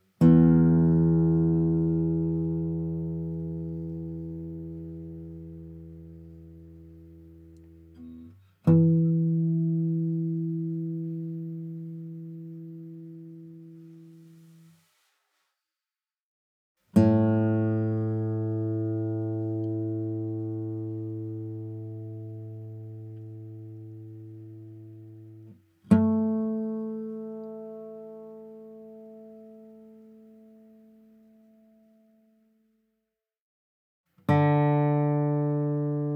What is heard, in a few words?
Tuner Standard guitar